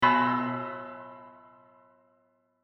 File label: Hush, Lullay, metal bar; Léonie Adams